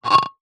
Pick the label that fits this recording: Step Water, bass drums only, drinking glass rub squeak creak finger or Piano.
drinking glass rub squeak creak finger